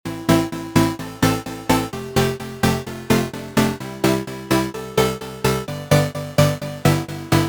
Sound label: Edm Chords